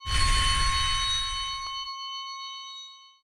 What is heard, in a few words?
Call